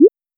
recharge capsule; seeding-planets

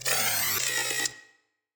build; seeding-planets

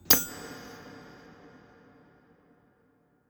pickaxe cave; seeding-planets

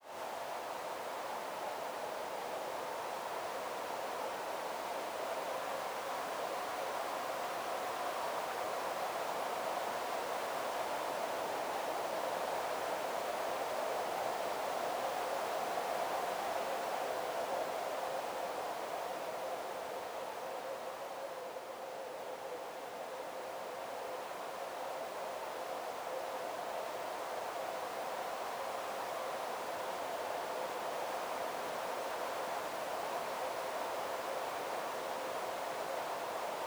ambiance windy

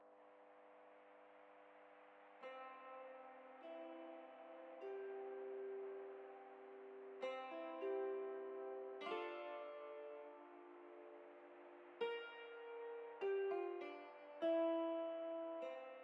ship music; vaisseau

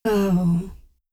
ohhh, voices; dialogs

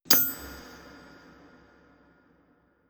pickaxe cave; seeding-planets

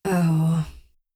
ohhh, voices; dialogs

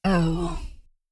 ohhh, voices; dialogs

